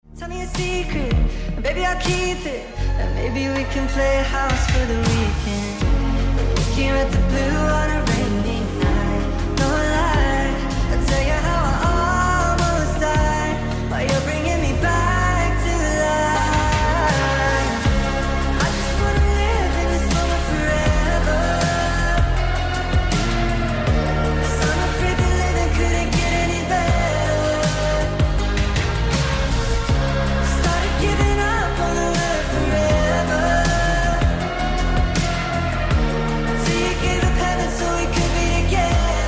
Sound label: music